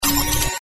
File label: hit; Danger Mouse - Ultimate; loocifer